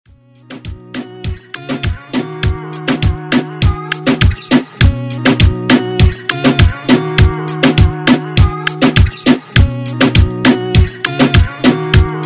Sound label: Afro Moombah; Redrum